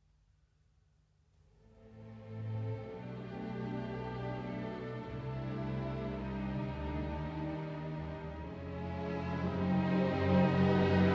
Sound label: Cello Concerto No. 1 in E-Flat Major, Moderato